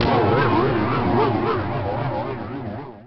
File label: saucer land